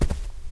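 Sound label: dark templar foot; Kara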